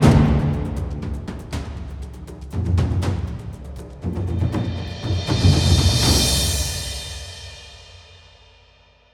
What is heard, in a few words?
Stinger Aqueduct; ryse son of rome 2014; Oswald